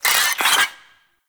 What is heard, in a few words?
Infested Ashen Vocal Pain; Warframe